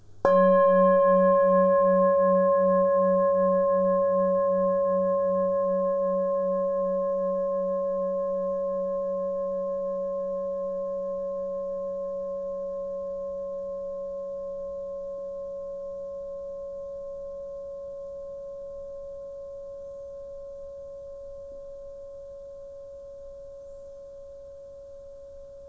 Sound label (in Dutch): Metalen klankschaal